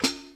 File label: Hat down